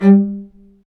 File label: strings low marcato; Roland LCDP13 String Sections; STR Combos 1